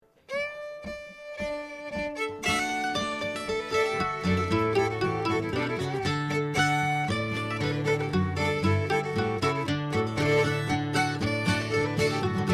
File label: String Band Flop Eared Mule; Bowdoinham; recorded at a dance